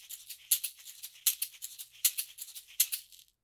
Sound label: caxixi